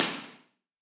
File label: Room; Discovery; Impulse Response; EchoThief Impulse Response Library